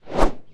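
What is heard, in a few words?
cavalry mace, weapon; Battle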